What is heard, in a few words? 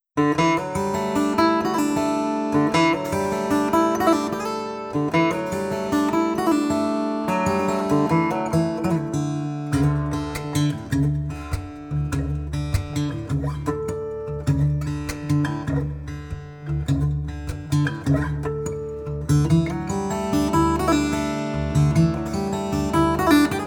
Shuffle